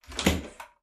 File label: door wood open